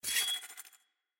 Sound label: Cable Warning; Puzzle